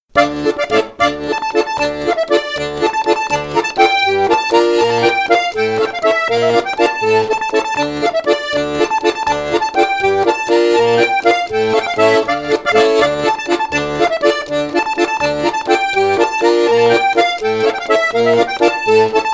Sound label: Trikitixa in CF; Trikitixa Zero Sette; Etxebitarte; Staalkaart